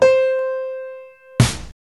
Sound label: piano snare